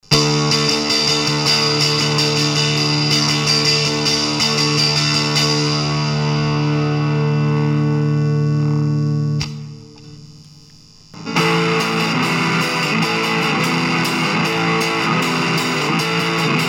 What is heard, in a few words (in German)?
e-gitarre; IMG Stageline ECMS 90